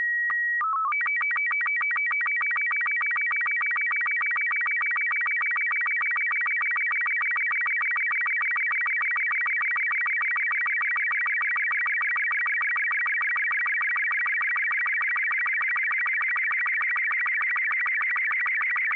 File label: sstv robot36; アマチュア無線